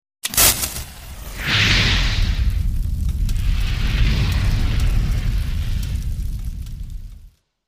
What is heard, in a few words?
Fire Igniting